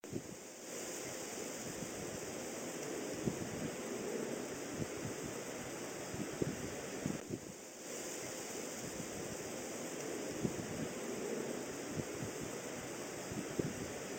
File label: Wind On Grass